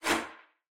Menu Close; Portal Quest

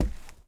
FOOTSTEP Walk Trainers Wood Boards; mono; adams venture origins

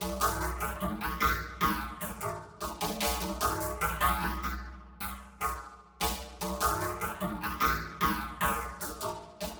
Drum Layer

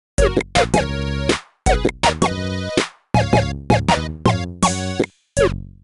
Jingle Good; Franzoesisch Vokabel Buddy; NDS

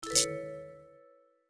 Sparkling Bomb Awake; My Cute Commissar

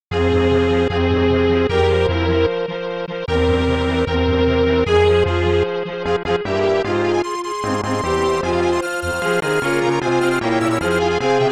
Requiem in D Minor K.626 Dies Irae; Wolfgang Krauser; Garou Densetsu 1-2 Special; SNES

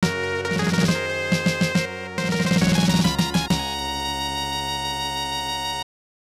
Victory Short; Shinseiki Odysselya SNES

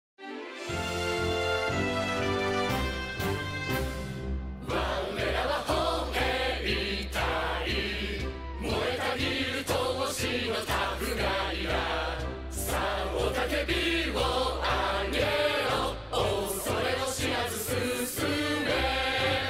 Infantry Song (Japanese version); Earth Defense Force 5

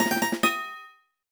Correct; the legend of zelda phantom hourglass gamerip; Question Four Correct

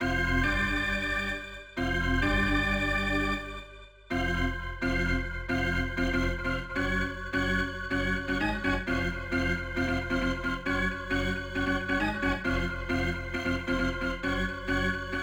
Organ; World Series Baseball 2K1; Dreamcast

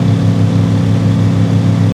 Ford Mustang Low; car simulator 2

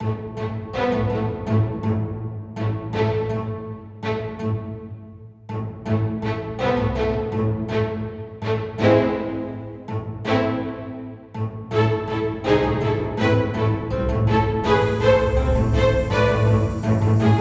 Battle March (Medieval Battle); simulator original video game soundtrack 2021

